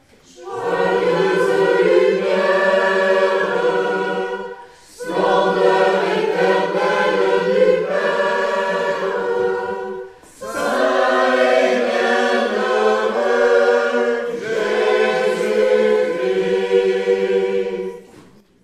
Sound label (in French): Tutti; Joyeuse lumiere